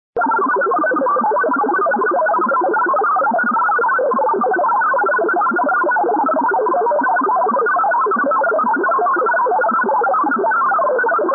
crowd36

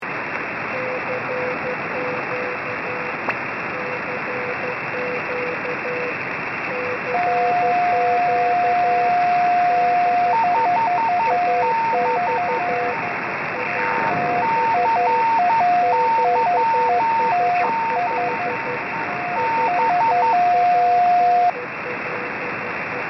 beacon

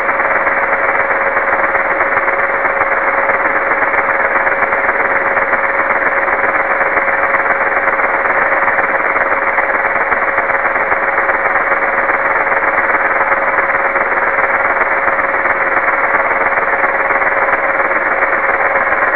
signal

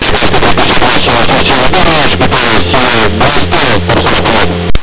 bad modul

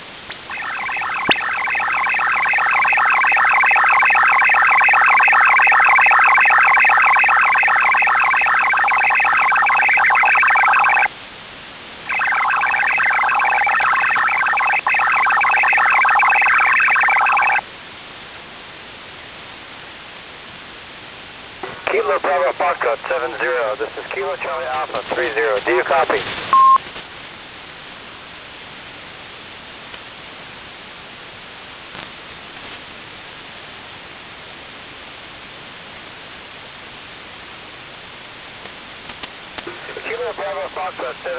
111685 khz ale-2g voice; kbf70 kca30

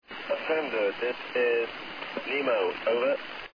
fender

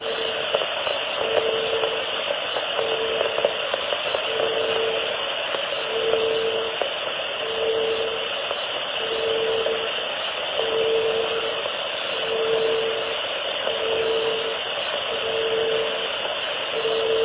149 MHz

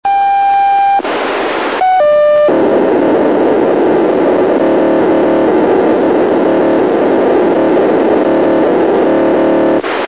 signal

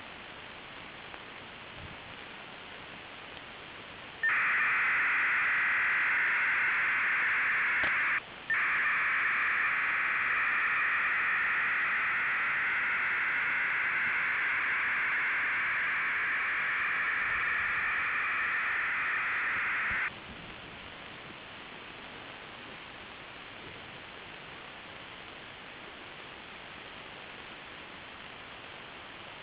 psk-2 1200 bd; msk f-6816 khz

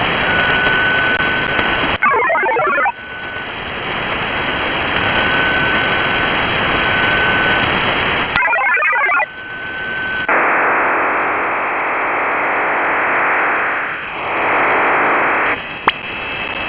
7047khz vara hf modem

